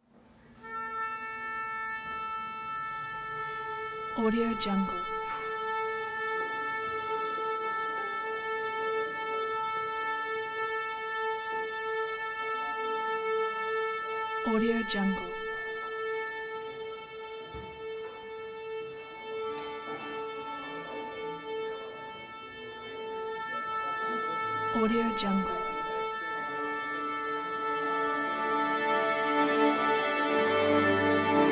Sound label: orchestra tuning warmup